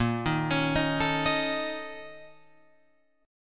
A sharp Minor Major 7th Double Flat 5th, A sharp, down, Guitar, Open F; A#mM7bb5 arpeggiated; JGuitar